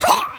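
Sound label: voice outcry large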